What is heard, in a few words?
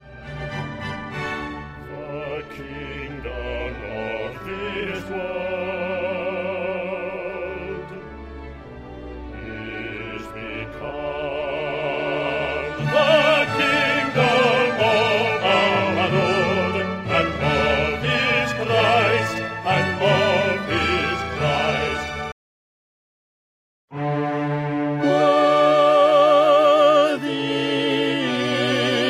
bass, Sing Along; Bass Learning Track; New Church Day Pageant